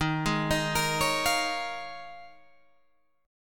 Eb 9th Suspended 4th, Guitar, Open G

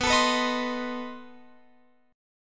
B 7th Flat 9th B Guitar Open F; B7b9; strummed

